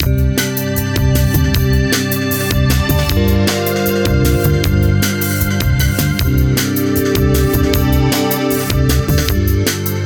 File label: Loop